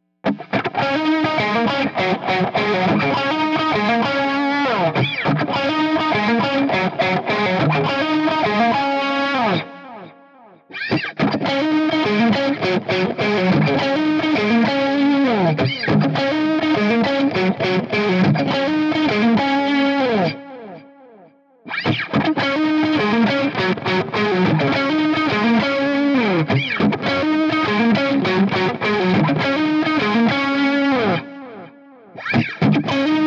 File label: Strat Hot - Bridge through Neck; Voodoo; pickups; BYO Pickups